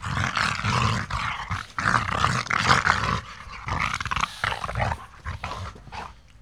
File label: gorilla snarl, animals; Sony Pictures Sound Effects Series